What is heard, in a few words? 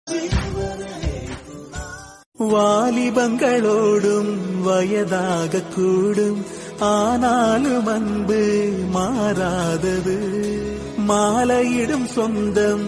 HAPPY ANNIVERSARY TAMIL RINGTONE